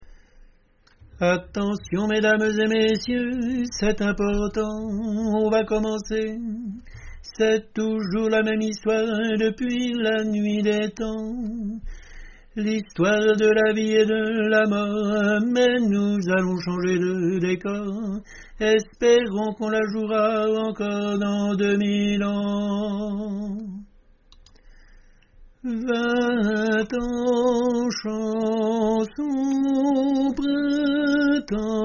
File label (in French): tenor